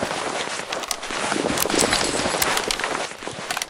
ammo pack use; Left 4 Dead 2

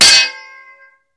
hit metal metal; Mount&Blade Warband

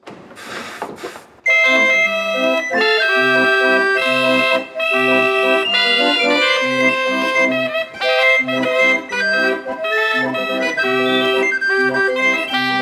Tivoli Organ, Mechanical Instruments

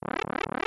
Pipe Warp; Terraria; WarpPipes Beta

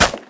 cardboard box impact bullet; physics; Counter-Strike Global Offensive

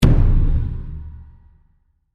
phase change drums; League of Legends; lol air client

